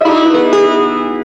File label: ETH SITAR; AMG - Now CD-ROM (Roland)